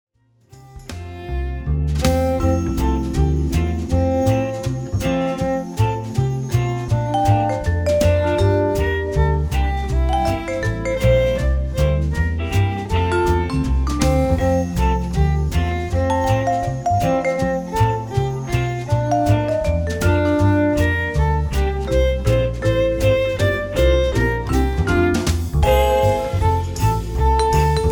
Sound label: Jingle Bells